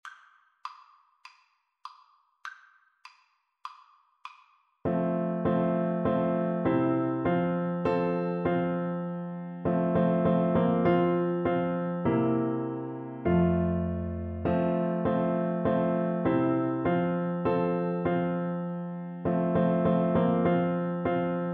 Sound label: blood of jesus, tuba